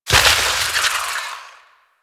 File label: Loot Tree Open Burst; Ambience; Warframe; Infested Eris